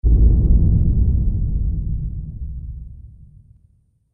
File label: Drum Hit Trailer; sound design